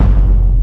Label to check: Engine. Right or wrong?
Wrong